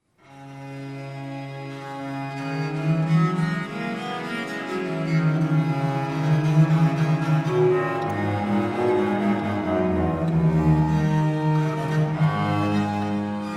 praeambulum organowej; 1619; from the oliva organ tablatur

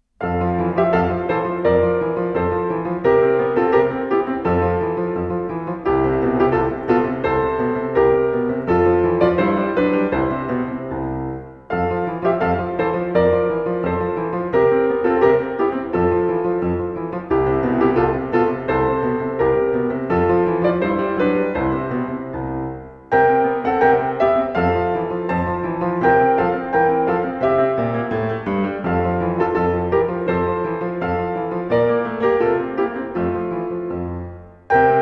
spinnerlied spinning song

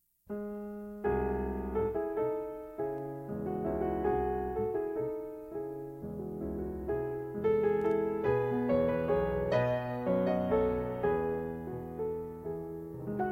waltz

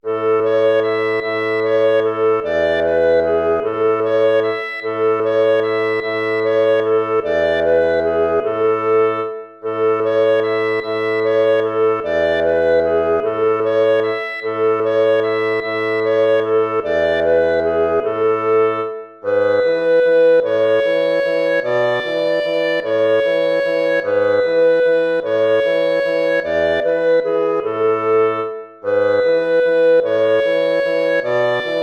waltz; Carulli Ferdinando

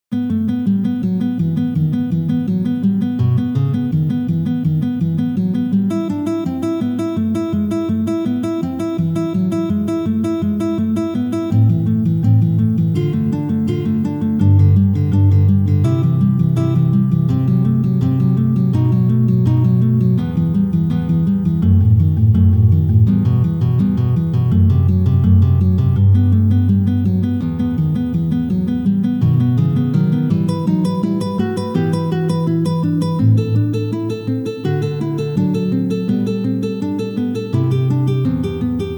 fugue; bach johann sebastian; bwv 565

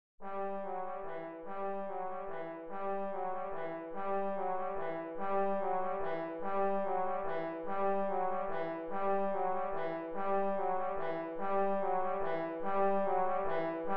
carols of the bells; leontovich mykola

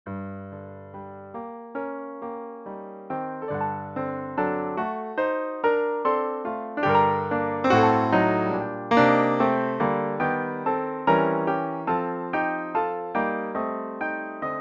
Japanese traditional; koito utatote

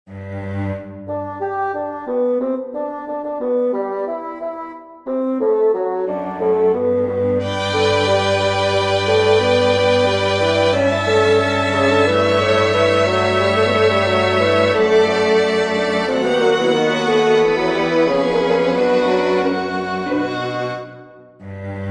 for bassoon strings; bach johann sebastian recitative jesus diese rede vollendet hatte

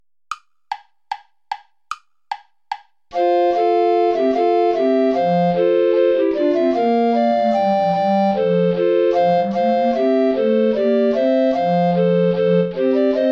bourree play along without soprano recorder; praetorius michael; bourree iii

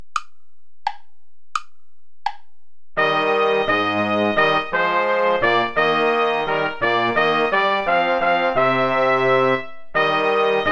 play along without trumpet; schutz heinrich; ich will solang ich lebe

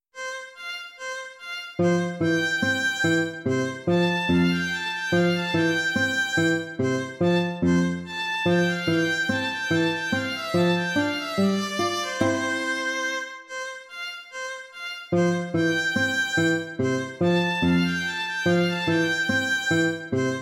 gavotte; corelli arcangelo

